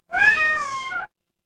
animal cat meow

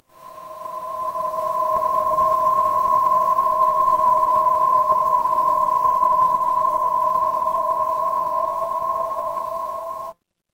ambience forest

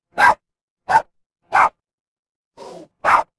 animal dog bark

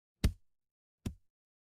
impact punch

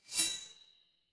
impact sword

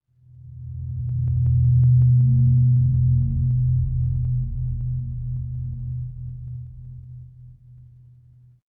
horror drone

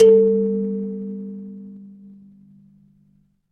instrument kalimba

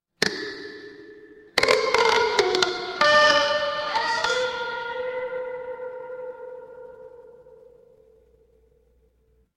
horror creak

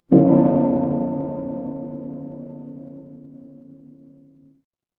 instrument gong

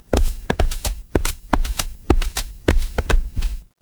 footsteps wood

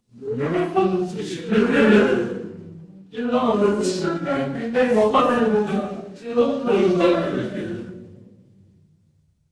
crowd gasp

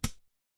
impact metal hit

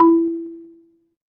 feedback confirm

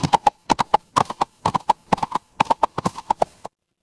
animal horse gallop

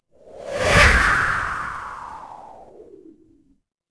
transition whoosh

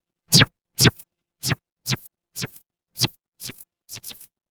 fire electric zap

